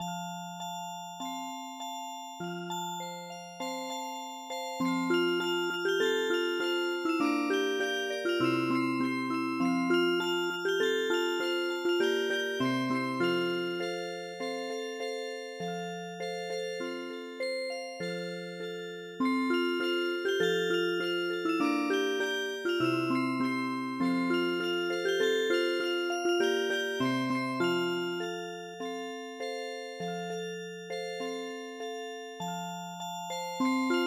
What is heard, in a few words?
Music Box, traditional; The Muffin Man